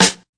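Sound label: snare, Classic Hip Hop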